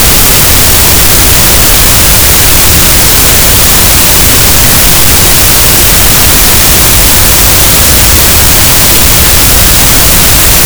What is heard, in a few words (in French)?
cachalot, Physeter Macrocephalus, gaussian noise